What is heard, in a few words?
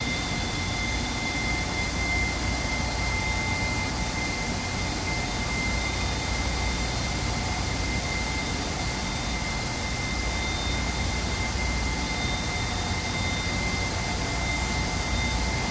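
Petroleum Plant Whine, factory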